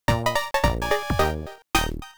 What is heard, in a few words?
Minigame Lose